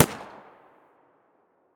muzzle mp9 mono far, weapons

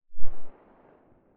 galil silencer mono very far

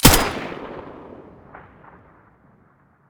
M4A1 muzzle stereo

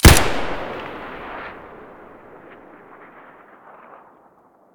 sks muzzle stereo, weapons

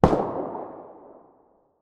galil muzzle mono very far

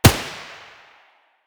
p90 muzzle mono distant, weapons

